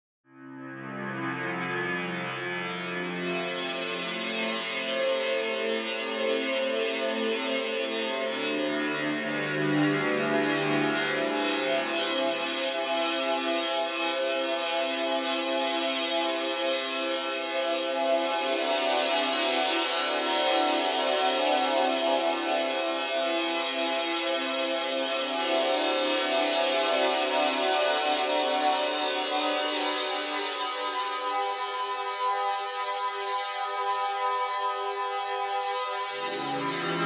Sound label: Padshop 2